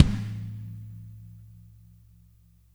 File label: AMB KIK; kick; Spectrasonics; Burning Grooves